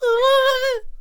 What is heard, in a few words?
Bomb Cannon Attack Vocals